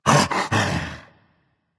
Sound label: yay berserker; cuchulainn